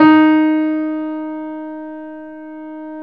PIA GRS, PIA_GRAND, Roland; Sound & Vision; Gigapack I CD 2; PIA Grand 4

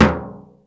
dohol H, Yamaha Motif ES drum machine; 300 Drum Machines; Yamaha Motif ES-Series

